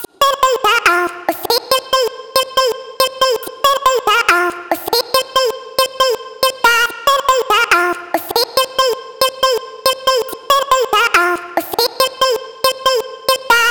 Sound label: vocal chop, 140, Fm; Life; Slate Digital Omnivox Vocal Sample Pack; Chops